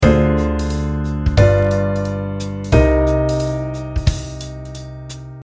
minor 2 5 1

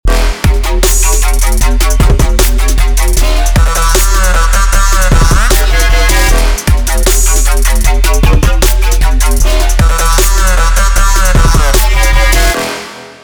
phrygian trap

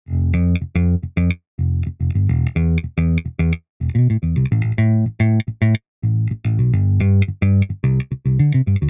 good bass

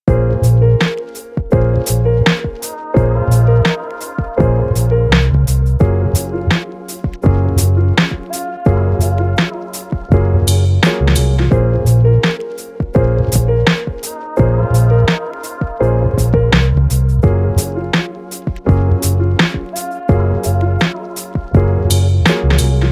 offbeat laidback, syncopation

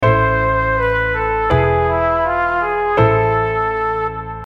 minor harmonic

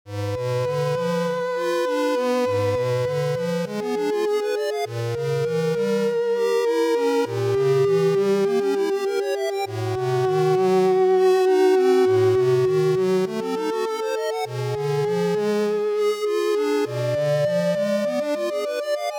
dim7 chiptune

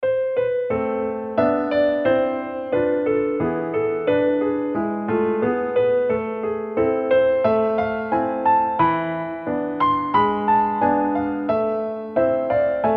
minor piano harmonic

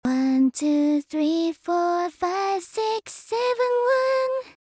solfa numbers

